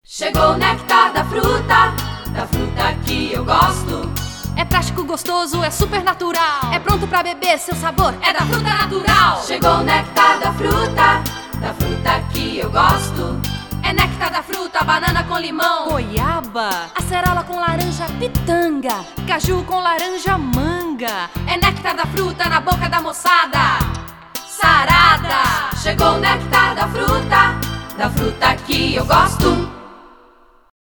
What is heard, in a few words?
jingle; dafruta